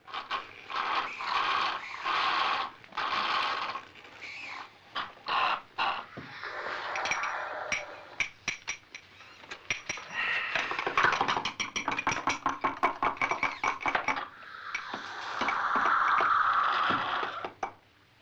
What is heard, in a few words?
LoFi Group Soda Suck; Vintage Cartoon; Comedy Vocals